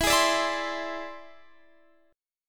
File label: E Augmented 7th E down Guitar Standard; E+7 strummed; JGuitar